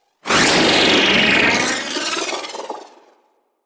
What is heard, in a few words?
Briefcase Launcher Proj By; Warframe; Weapons; Corpus